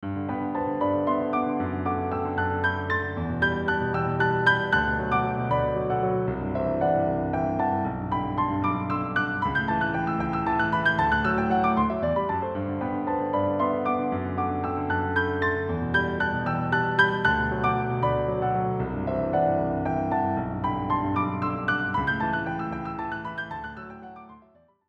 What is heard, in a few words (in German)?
praxis spieltechnik sexten und terzen